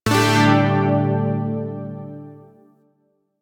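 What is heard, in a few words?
Shawzin Pentatonic Major Chord; Zariman Shawzin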